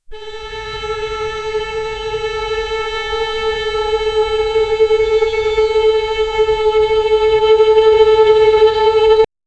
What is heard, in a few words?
one sustained violin note; strings